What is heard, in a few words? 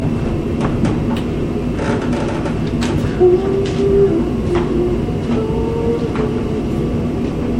singing old basement; willow creek farm